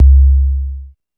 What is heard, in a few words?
808 BASS; East West - Phat & Phunky CD2; 90's Sample CDs